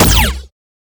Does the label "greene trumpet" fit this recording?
No